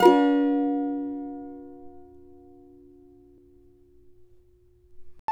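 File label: C minor, Guitars; Spectrasonics; Hans Zimmer Guitars VOL-2; CAVA CHORDS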